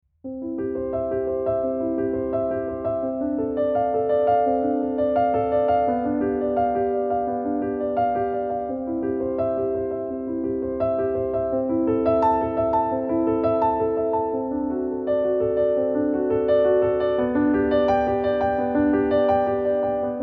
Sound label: bach prelude in c dur